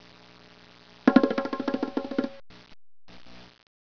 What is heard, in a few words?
bongo